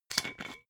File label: glass bottle massive open fast